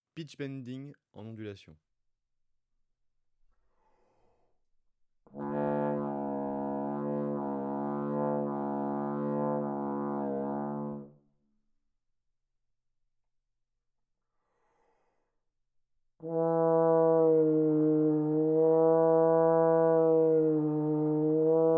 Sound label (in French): pitch bending en ondulation, trombone; Effets; Deformations du son; traite orchestration; trombone tenor trombone basse